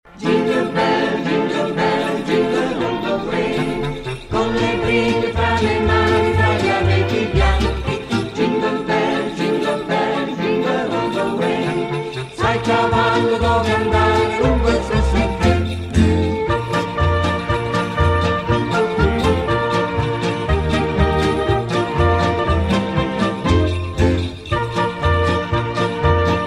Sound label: Jingle